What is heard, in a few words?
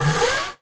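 creature hiss howl, vocal elements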